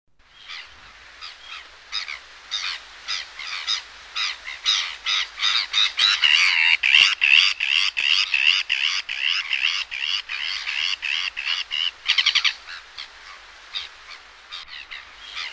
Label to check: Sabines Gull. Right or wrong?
Right